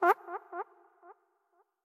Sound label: Gigabat Hurt; Going Under